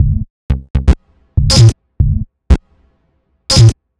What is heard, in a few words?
Clackers, 120 BPM, Percussion; Sonic Foundry (Sony Creative Software); Underground UK House & Electro